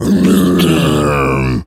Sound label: gorilla growl deep